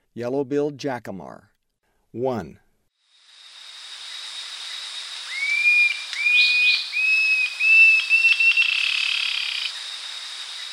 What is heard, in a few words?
Yellow-billed Jacamar; S. America; Eastern Lowlands